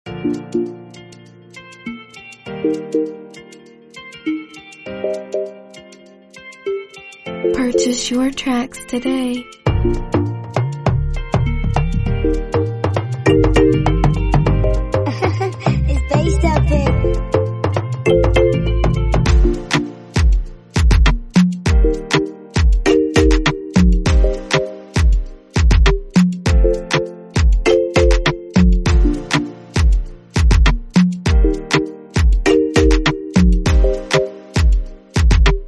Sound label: Afrobeat Instrumental